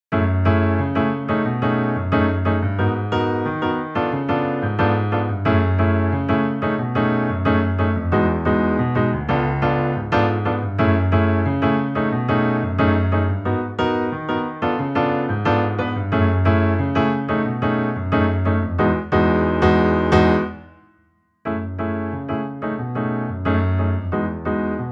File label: 90bpm e mellow